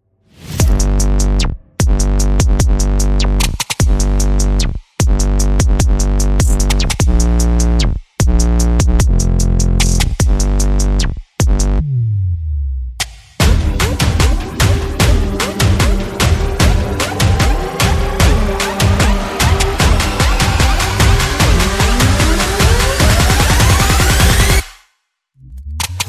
Percussion Bass and Effects

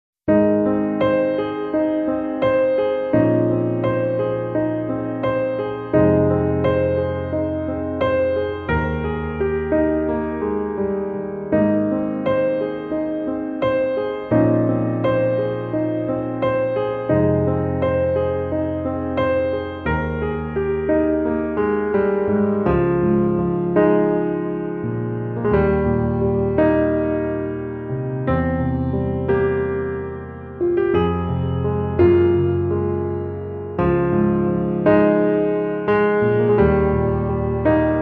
Piano Only

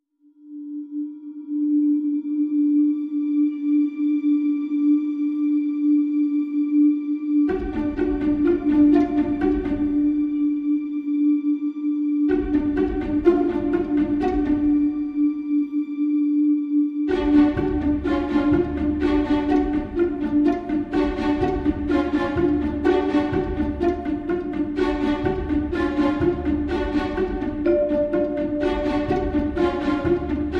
no perc

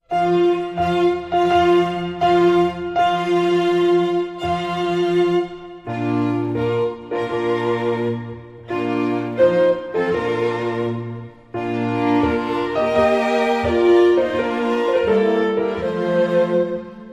Bridal Chorus (piano and string quartet)

